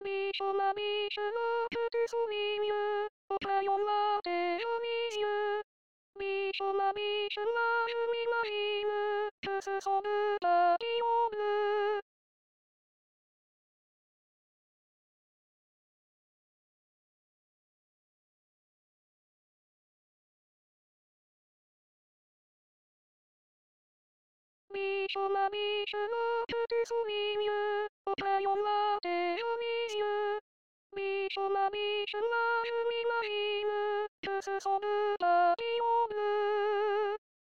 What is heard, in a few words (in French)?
soprano